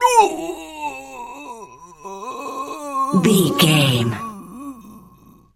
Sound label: voice male b death low